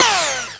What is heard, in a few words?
servo